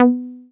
SYN 303, Roland TB-303

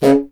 C. Bassoon, solo instruments

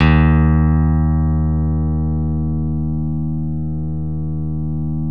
CP-70, CP-70 El.Grand; E-MU Producer Series Vol. 2 – More Studio Essentials

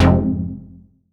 stab fiss, stabs

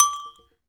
TAP, Perc Toys; Spectrasonics; Supreme Beats; World Dance

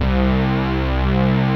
PWM SYN; Pro-Rec - Synthsonic

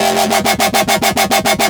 BASS SHOT Wob

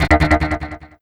Fill, FX; Sonic Foundry (Sony Creative Software); Esoterik Beatz

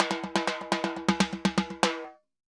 Timba Poly, Drums & Percussion; Best Service; Festa Latina VOL-1

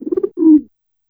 D3 Owl, Yamaha PSR-E323 Sound Effect Kit; drum machine

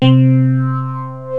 duckbill bass, Yamaha W-7; Rubber Chicken Software Sample Bank

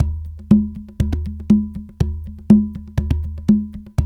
120, Coil Conga; Spectrasonics - Supreme Beats - World Dance

